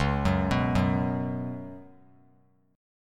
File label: Db 7th Suspended 4th Db down; JGuitar